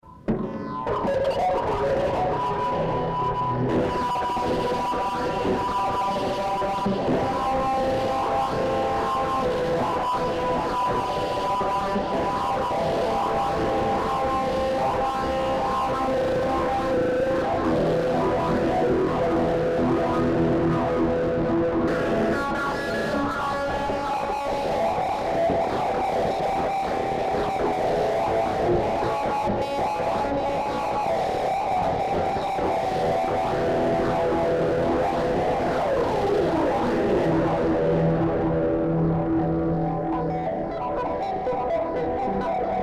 electric

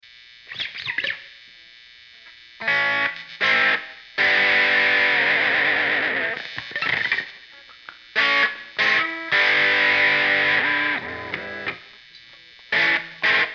electric

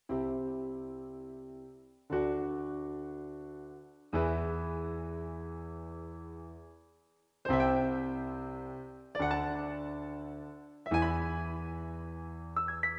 piano intro; Just the Thing

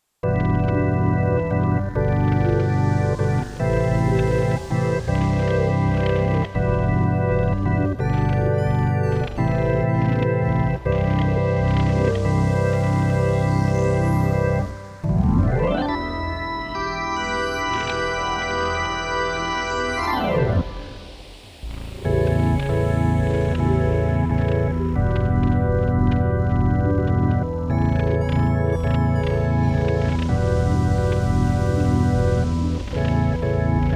synth

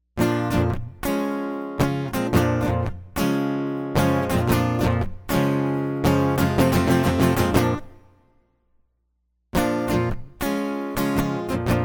acoustic; MegaEpix

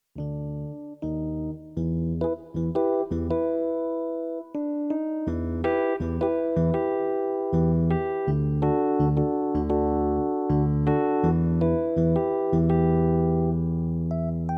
electric stage piano

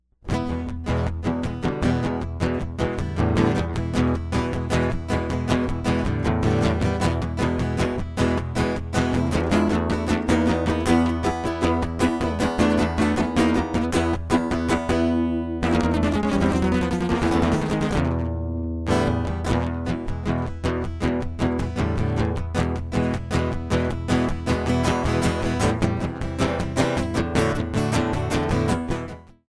acoustic; Less Steep Sheep

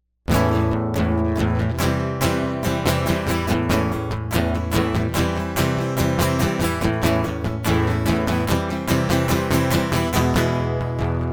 acoustic

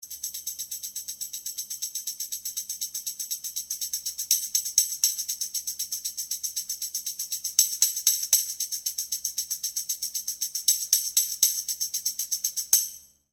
tambourine; MegaEpix Enormous

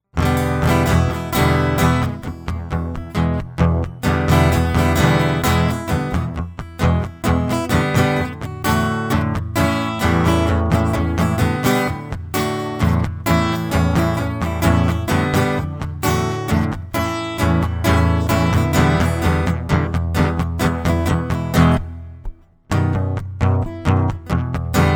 acoustic; MegaEpix

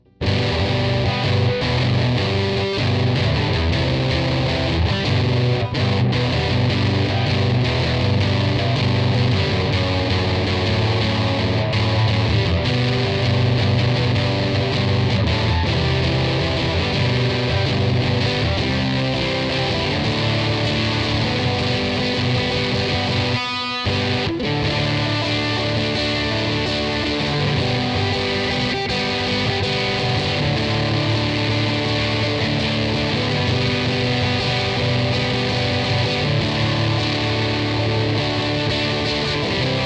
electric; MegaEpix

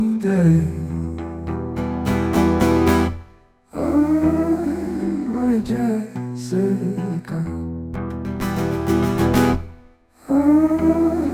Unplugged

